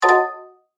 Multimedia Chime, Multimedia, Element, Chime, Stutter, Sweeper; BlastWave